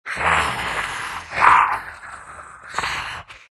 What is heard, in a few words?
big snarl; Undying